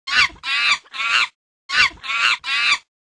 ape

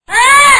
parrot

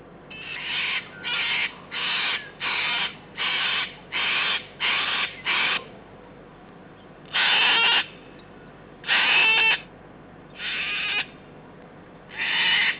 cockatoo

